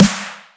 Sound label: snare; osu